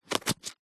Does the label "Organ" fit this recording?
No